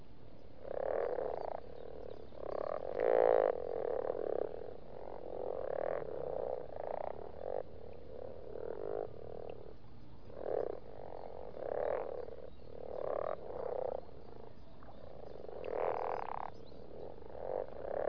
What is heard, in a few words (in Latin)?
rana temporaria